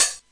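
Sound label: 909 bright open hi-hat; Hats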